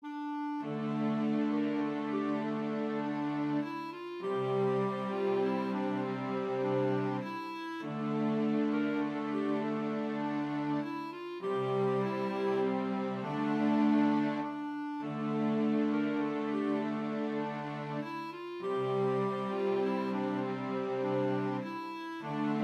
Drone Unit; Bonny at morn

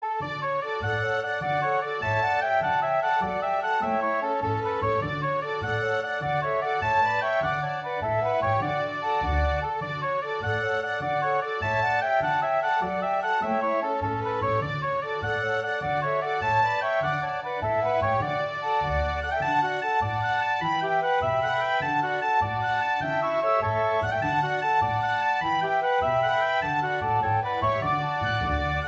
48 bar Jigs

